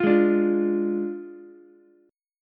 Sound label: F Minor 6th, Ukulele; Fm6 strummed; 10,8,10,8; JGuitar